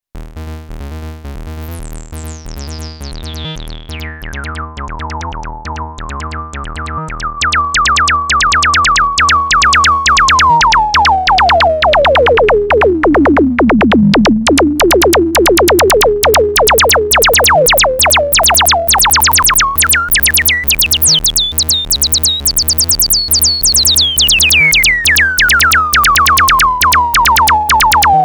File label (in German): DSM01 24dB maximale Resonanz